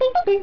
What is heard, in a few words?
Death; Macworld (1997-11); Games World; Shareware Games; Arcade; Peng! 1.0